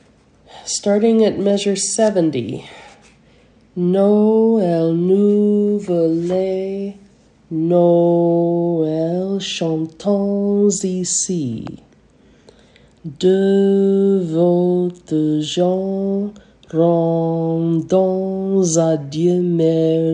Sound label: Christmas Comes Anew French pronunciation